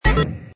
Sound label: Hi Tech Panel Servo Button; High Tech Sound Effects; Lynne Music